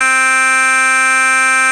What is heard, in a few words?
alien